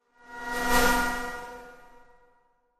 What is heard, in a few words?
Static Buzz By Thin, passing elements